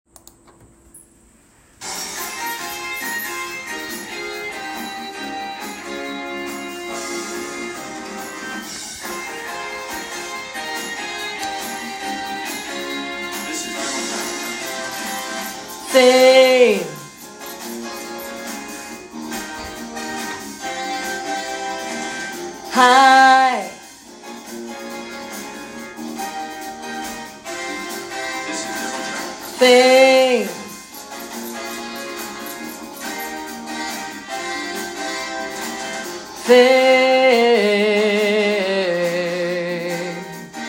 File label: Bass; Fame The Musical - Holiday Pack